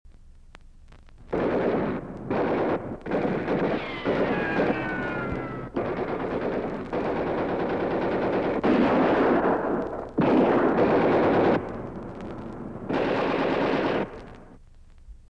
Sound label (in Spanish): metralla